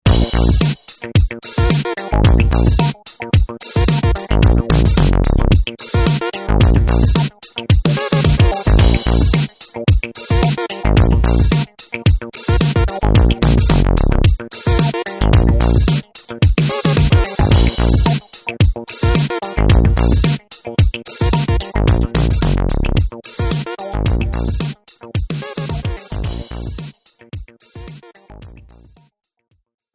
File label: Funk